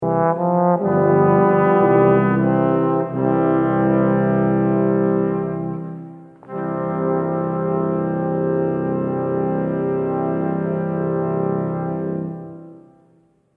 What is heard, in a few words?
Waltz; Brass